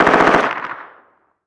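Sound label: gun mp5 distant; Call of Duty Modern Warfare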